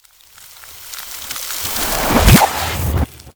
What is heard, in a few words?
Ice Spell Power Up Speed Boost; Arctic Aura